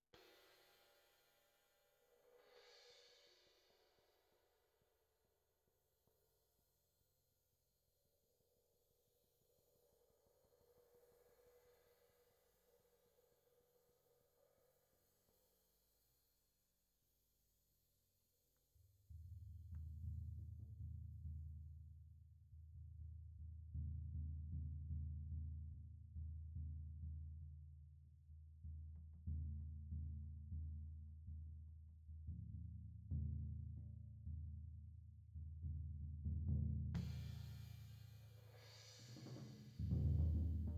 Floor

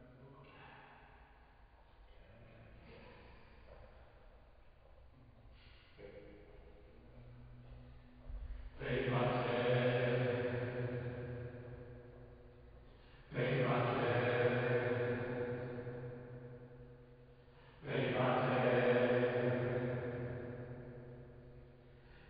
Choir

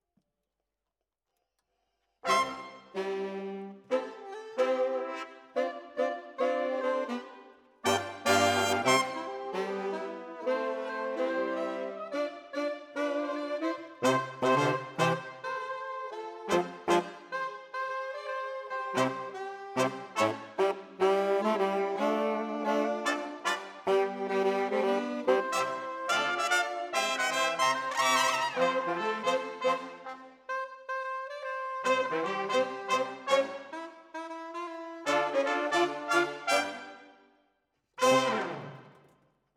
Sax

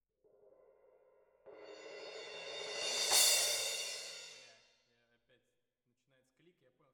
Perc

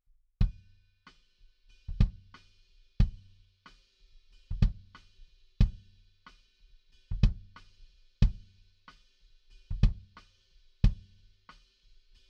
Kick in

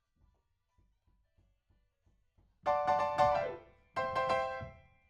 PIANO, RNR for piano

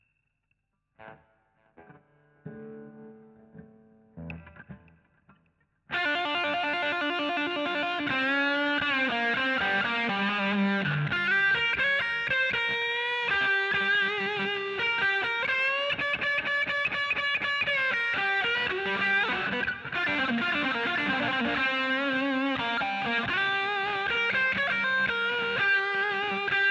GTR A Solo

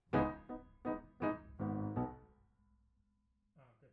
Piano B

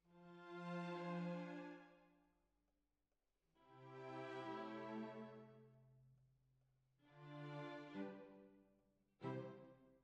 Oboe

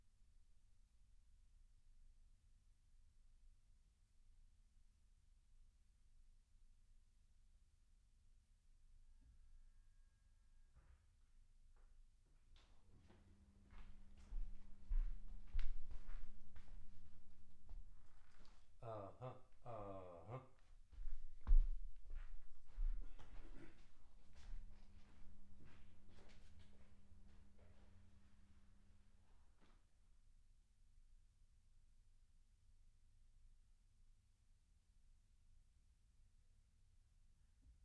TROMBONE

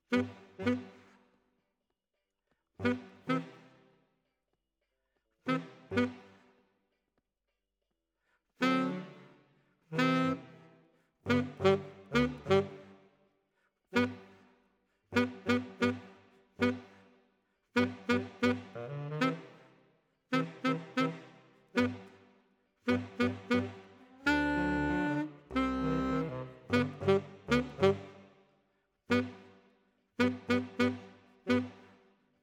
Alto, RNR for piano

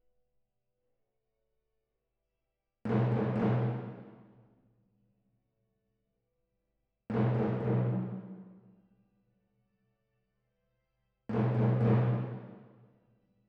Timp

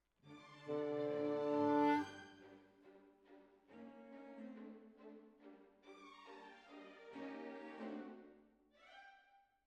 Clarinet

